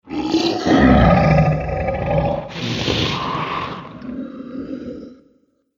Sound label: Dragon Growl And Snort, monster; horror; Monster Sound Effects